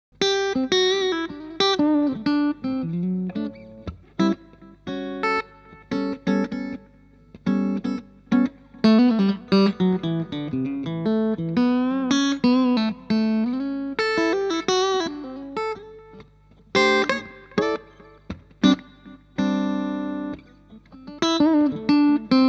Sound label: Clean